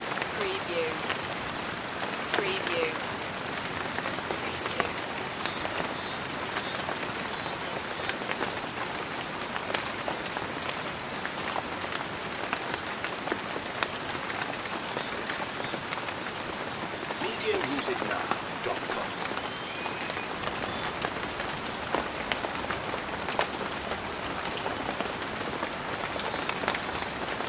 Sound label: rain below trees